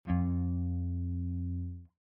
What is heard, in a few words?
F low